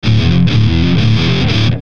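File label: Musical Element, Drum Snare March; Blastwave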